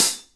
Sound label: Hi Hats; InVision Interactive; Lightware VOL-1